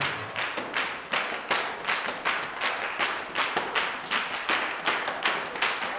160 TAMB BRK, blues soul

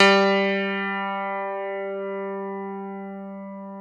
PNO, Electrics, Grands

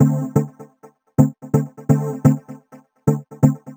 Minimal B, Underground UK House & Electro, Synths; Sonic Foundry (Sony Creative Software)

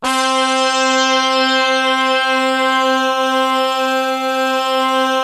BRASS KIN, MORPHEUS; Syntec; Wall of Sounds

